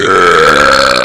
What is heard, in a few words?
burp